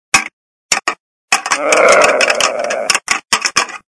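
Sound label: Antler Buck Distress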